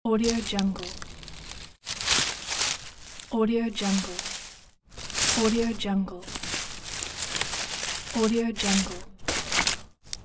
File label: dry leaves autumn rustle pack; epx lab